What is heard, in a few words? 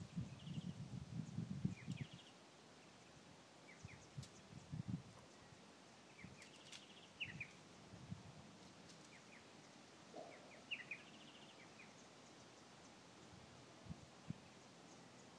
small birds at church; ebenezer church